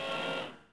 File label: astromech Motor end